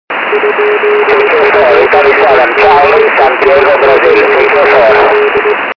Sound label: 70200khz; 70mhz